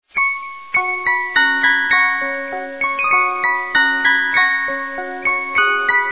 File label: whistle; Reuge